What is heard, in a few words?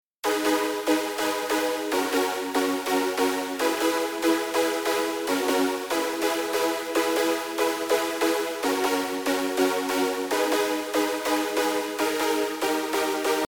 Rhythm Emotion Syn Back